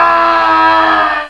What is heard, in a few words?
Death